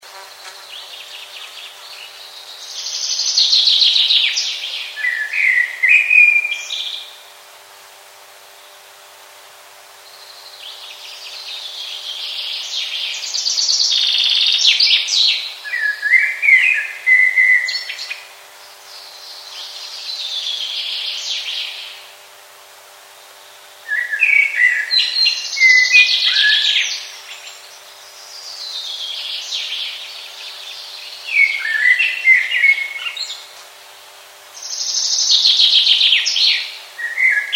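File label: common blackbird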